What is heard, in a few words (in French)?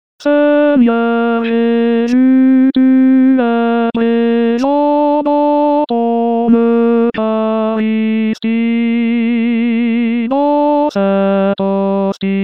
Seigneur Jesus Tenor, Chorale